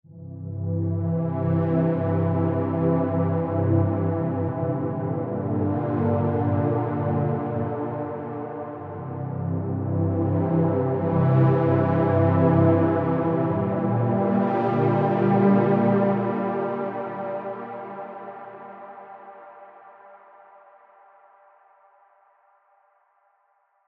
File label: pad wet